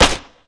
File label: garg claw; slender